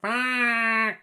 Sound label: Fark bird; SyrinScape; Syrinscape unplugged; Jungleplanet dinolaser battle